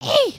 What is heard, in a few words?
wave, emotes